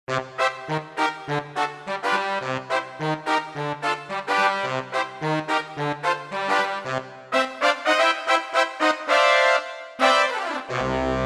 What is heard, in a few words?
Sax Tp Tb, Roland JV-880; Factory Patches